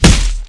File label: body medium impact hard; physics; Garry's Mod; hl2